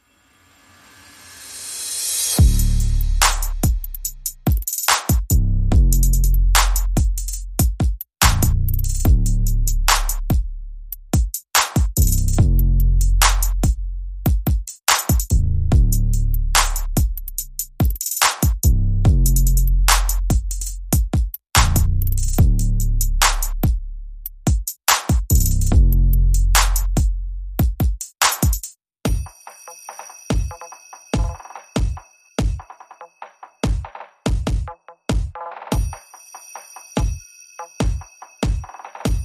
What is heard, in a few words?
Drums